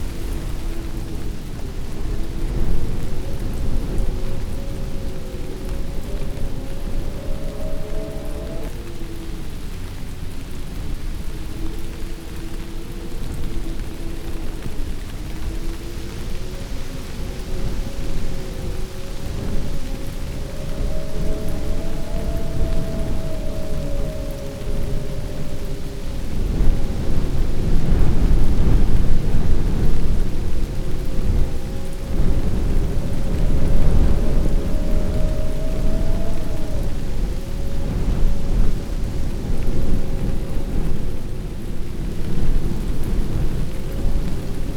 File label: hurricane monsoon tornado; calendaria